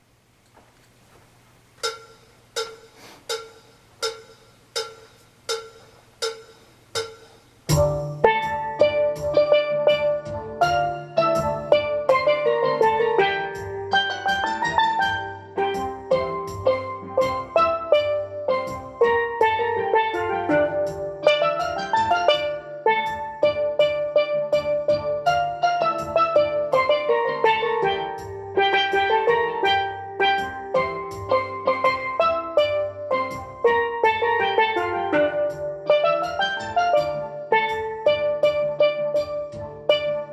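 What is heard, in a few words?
TENOR, Steel Band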